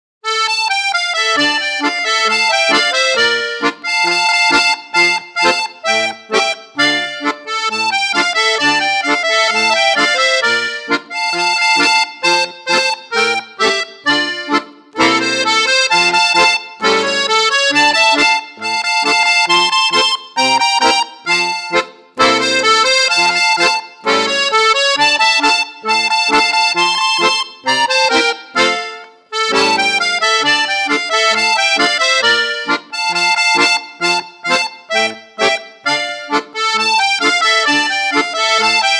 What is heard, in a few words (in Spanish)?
Cumbia Colombiana